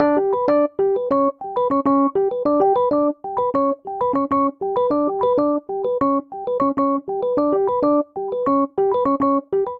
Ostinato Latin Cuban E Minor - A7 98 bpm, Electric Keyboards; MIDI Clips; Ableton; Factory Packs